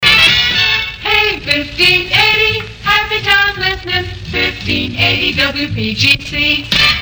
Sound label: jingles highland happy sounds open; pams series 9